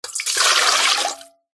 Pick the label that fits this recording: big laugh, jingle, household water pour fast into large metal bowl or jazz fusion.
household water pour fast into large metal bowl